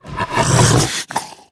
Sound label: Spake Dog Atk